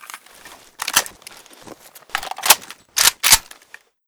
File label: svu reload empty, weapons